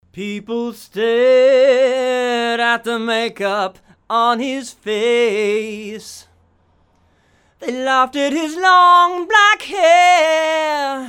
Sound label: Vocals